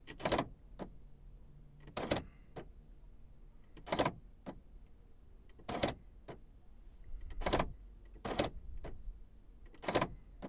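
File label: Unlocking And Locking Doors BMW 118i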